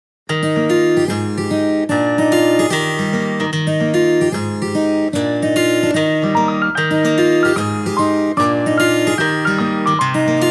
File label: bgm acoustic; maou; 寛大な心音楽; 魔王魂アコースティック32